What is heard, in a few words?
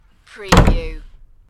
Door Slam